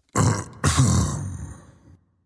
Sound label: clearthroat; smite; voicepack; xingtian